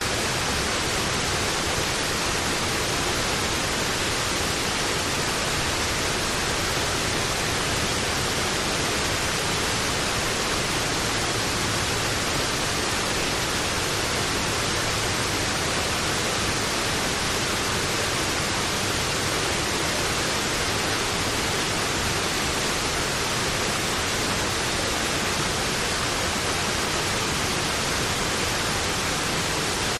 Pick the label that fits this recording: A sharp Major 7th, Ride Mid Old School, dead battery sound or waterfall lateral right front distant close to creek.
waterfall lateral right front distant close to creek